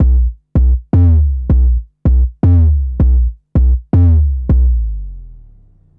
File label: Boom Bass, Drum & Bass; Fluid Dynamics